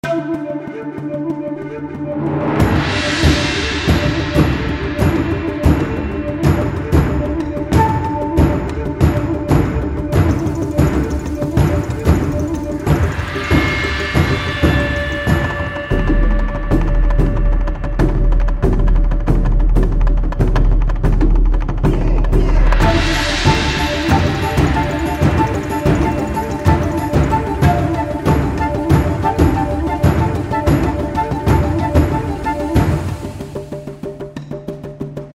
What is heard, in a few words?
river chase, music